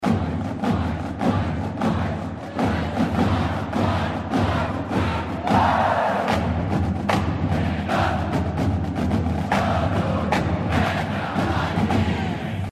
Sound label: cadence